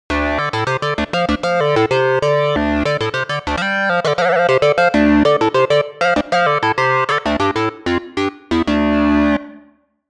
Minimoog cool 5th; opx pro3

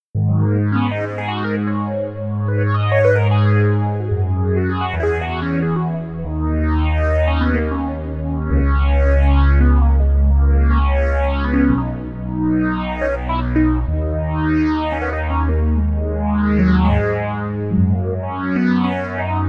the lowpass pad

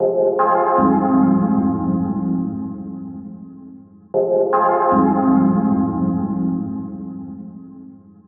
dub techno loops loop

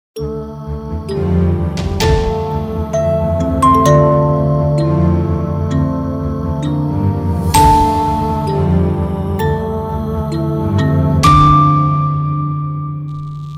music box

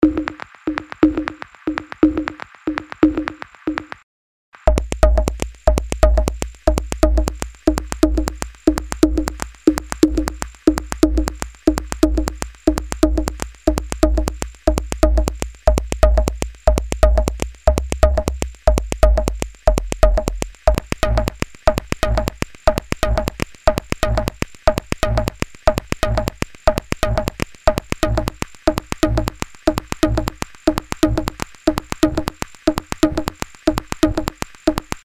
eq and distortion

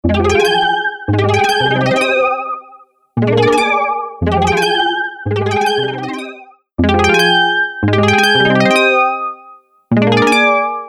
instant flanger mk ii

